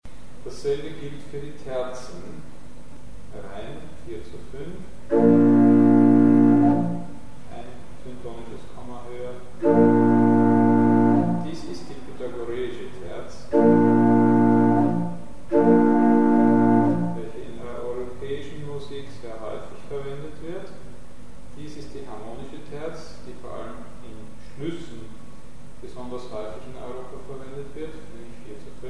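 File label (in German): Terzen groß, verschiedene; Mikrobuch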